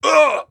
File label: worm death